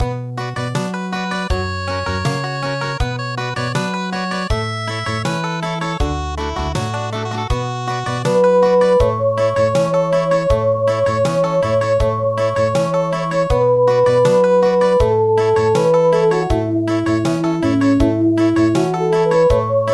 Country; Autumn Mountain Song

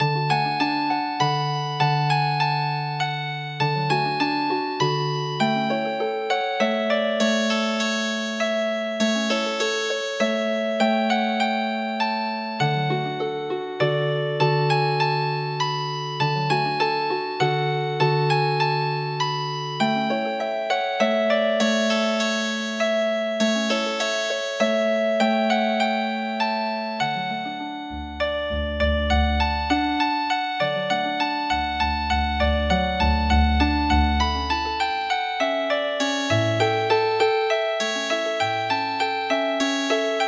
Oberek Healin Harp

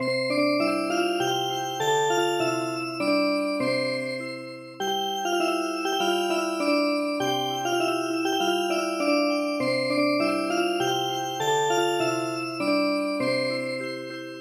Music Box

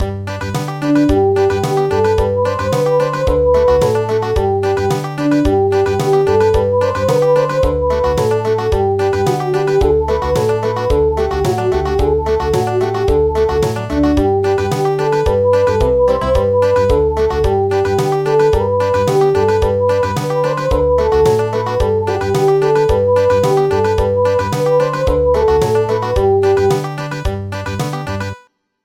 Country; The dance of barley

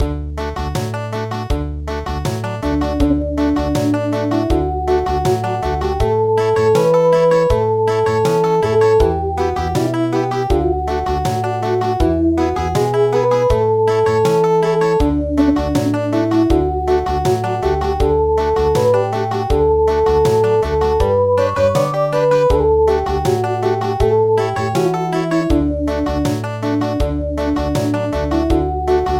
Country

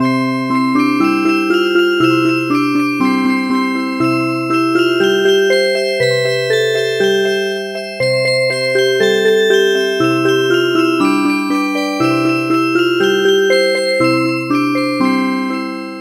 Music Box, traditional; midi traditional; kokkiliwa geomijul

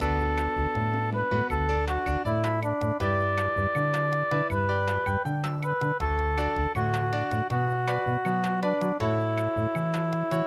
bossa; Massas In De Cold Cold Ground

